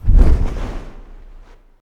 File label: flap